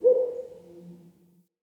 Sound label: Cave Ocean Animal Very Far